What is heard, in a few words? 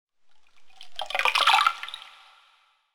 water